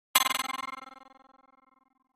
Pop Up Tap Tremolo

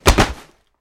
Body Falls On Cement

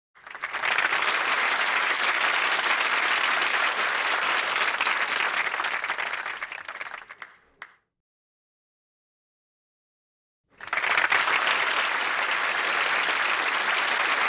Crowd ( 15 ): Int / Rowdy, Oh! / Chee; small int

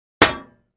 Impact Metal Sheet, flexing metal

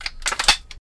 Uzi Metal Mvmt Cocks

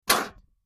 Air Mortar Blast From

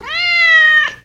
Cat Cute Meow Generic

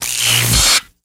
Electric Arc Discharge, Fast Rise Burst; arcs & sparks

